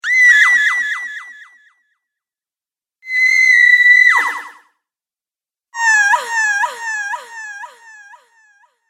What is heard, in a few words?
Female Scream Echoes Collection; Screaming